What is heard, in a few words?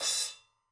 Crash